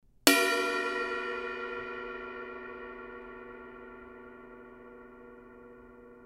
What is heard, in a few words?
Kerope Medium, cymbal sound